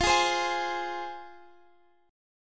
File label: F Minor, Guitar, Drop D